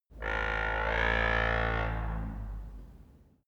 wood door old creak

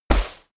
step walk grass; schritte

